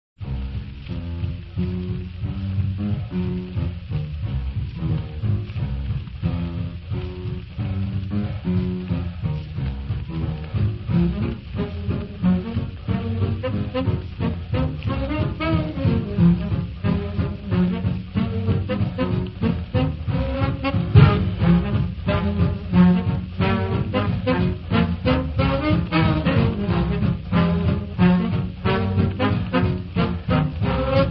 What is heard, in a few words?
music; moses